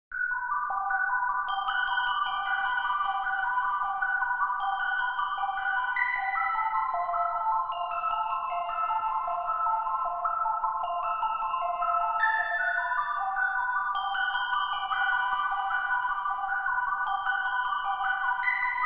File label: Loading Menu; bomberman world